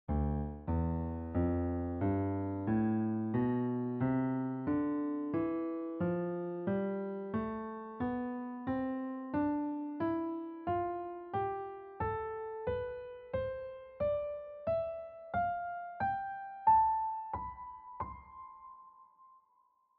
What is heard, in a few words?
grand staff notes; four octaves of the C-Major scale, where each note is one quarter note in duration; key of this example is C-Major